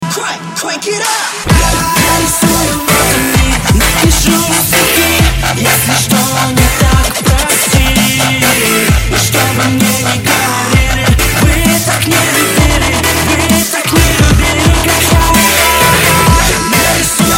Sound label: dubstep